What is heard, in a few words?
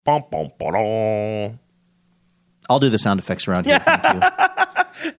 making sad horns saying ill do the sound effects